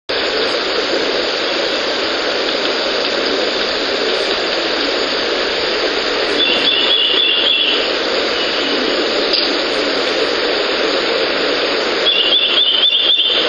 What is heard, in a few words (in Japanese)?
シロハラ？; 遠藤